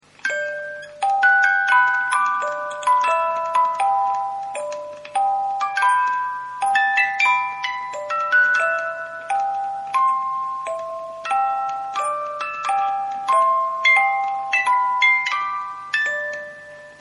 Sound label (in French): boite a musique